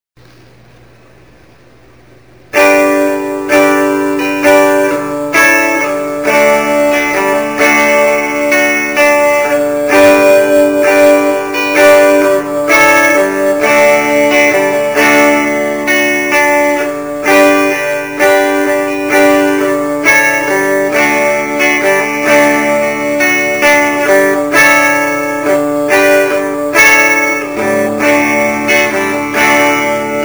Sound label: Rythm